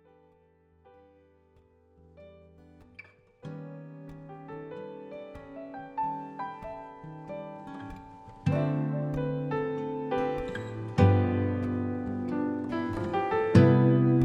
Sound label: Swing E